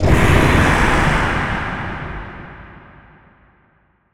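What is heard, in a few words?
Distant Abstract Scream Short, monster sounds; TheDeclineOfWarriors; Monster Sounds Volume II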